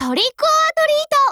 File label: weapget gren, ump9